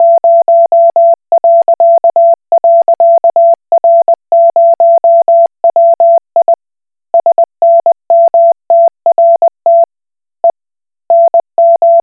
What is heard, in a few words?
Koch Morse